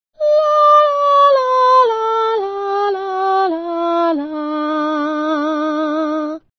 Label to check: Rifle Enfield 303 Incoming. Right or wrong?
Wrong